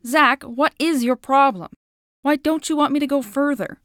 ghost Whining; Keepsake; english; basement

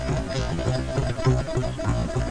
Icon Machine; Let's Discover Michigan!

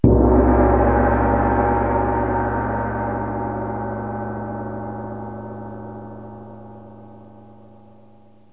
long gong; music; Wired for Sound Pro CD; Aristosoft, Inc.

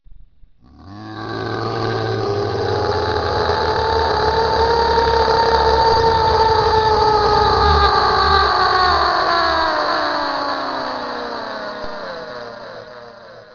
dragon

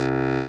noise

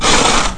shoveling sand; Sound Effects for Multimedia - Volume 1

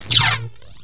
travel lightning; Earth and Beyond; EA Games; emp

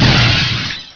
shard; Hexen II; imp

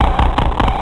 Indus; Revista CD Expert 50

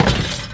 crash

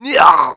pain; Grounch

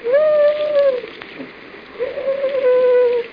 walrus; creatures

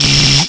ZAP; Carmageddon Demo

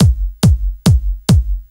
Drum A, Techno Drums; TechnoMusicMaker

